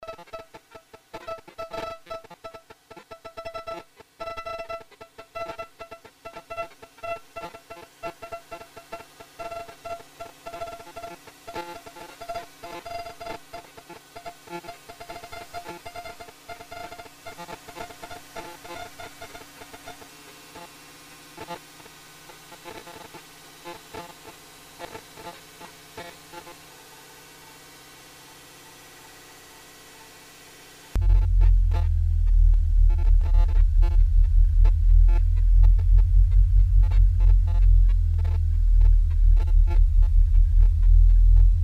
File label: picks